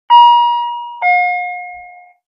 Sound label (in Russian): predupreditelnij signal medicinskogo pribora